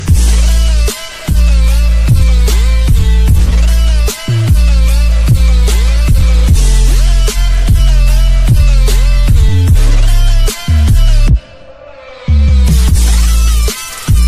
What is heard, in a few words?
music english ringtone; english ringtones